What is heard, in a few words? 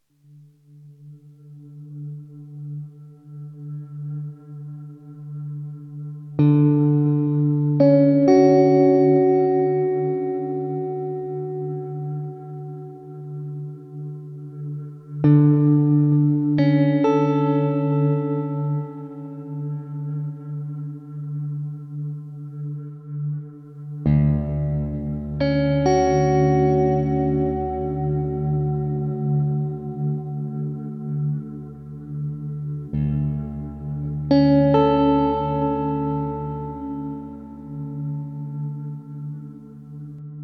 Bittersweet Guitar